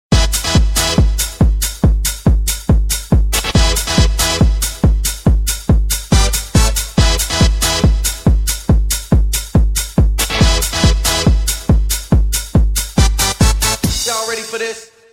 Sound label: Electro